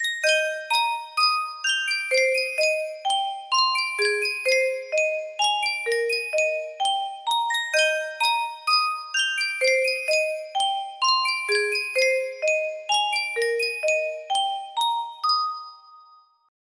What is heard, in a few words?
music box; sankyo